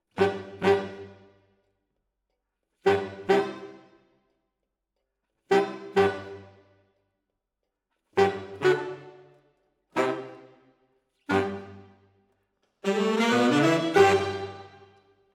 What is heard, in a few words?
SAXES